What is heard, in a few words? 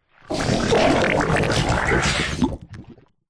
cartoon slime gurgle dribble pop; zapsplat